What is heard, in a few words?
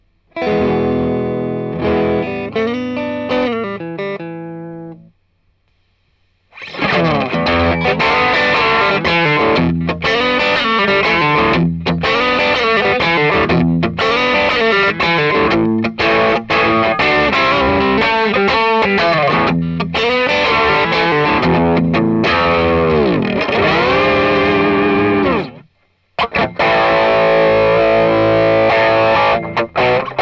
Vox MV50 Clean Amp Treblebooster On